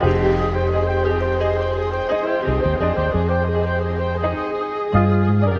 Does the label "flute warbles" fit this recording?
Yes